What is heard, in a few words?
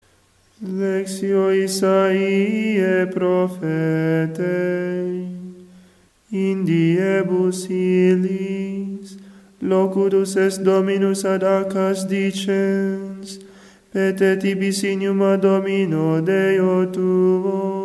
Epistle; Annunciation